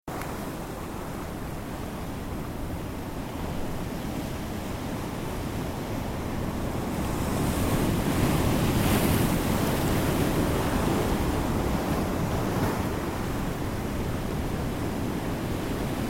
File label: otter waves on rocks